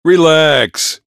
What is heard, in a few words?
soldier positive vocalization